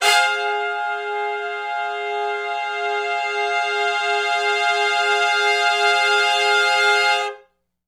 2 ms Long Note w Swell (F) har-minor, Horncraft for R&B; Sonic Foundry (Sony Creative Software); Sections